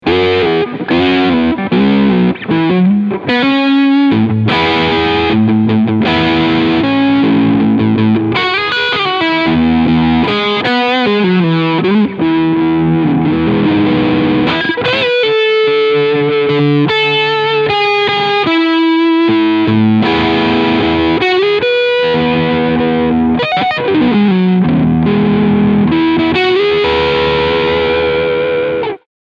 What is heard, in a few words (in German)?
Schecter KM 6 Crunchsound beide Pickups KM Modus